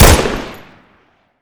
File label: hipoint; garrysmod